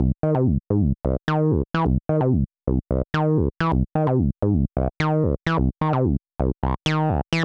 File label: MOD EQ